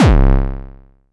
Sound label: BD; TRX